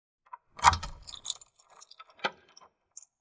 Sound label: keys locking door; soundscrate